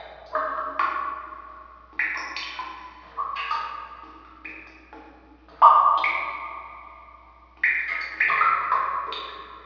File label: SFX SEWERS; Gothos